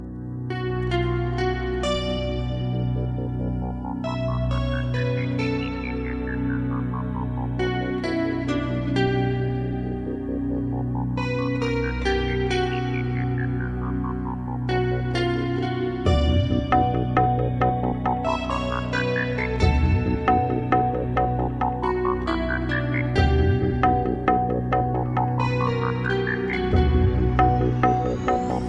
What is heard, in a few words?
classic trance; jazz